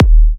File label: D#, Kicks; markus hakala